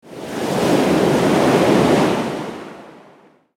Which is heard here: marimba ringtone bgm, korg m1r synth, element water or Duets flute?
element water